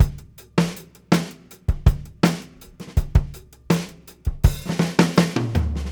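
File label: 81 bpm, beat loops; Zero-G; Monster Beats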